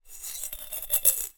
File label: Shake In Glass, Medium Wine Glass